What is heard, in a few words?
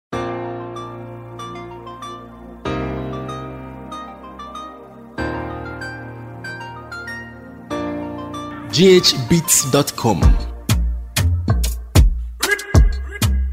Instrumental